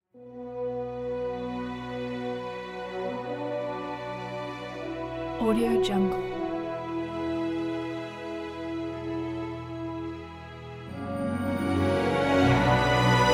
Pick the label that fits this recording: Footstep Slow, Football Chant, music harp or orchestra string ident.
orchestra string ident